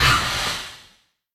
gate; HeavenStudio; shoot Em Up

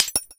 Crumble; HeavenStudio; built To Scale DS

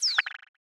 monitor off; HeavenStudio; fillbots

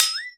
flick; HeavenStudio; fork Lifter

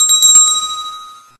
somen bell; HeavenStudio; rhythm Somen

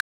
box Click; HeavenStudio; night Walk Rvl

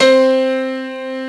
Piano; HeavenStudio; built To Scale DS

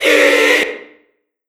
crowd ii jp; HeavenStudio; fan Club

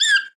jump tap; HeavenStudio; tap Trial

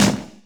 march Offbeat; HeavenStudio; lockstep

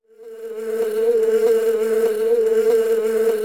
fly close loop; HeavenStudio; chameleon

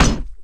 freezer Put; HeavenStudio; lumbearjack

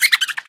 weasel hide; HeavenStudio; double Date

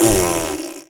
spit; HeavenStudio; TapTroupe

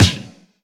kick; HeavenStudio; double Date

